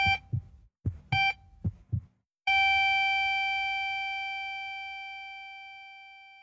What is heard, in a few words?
flatline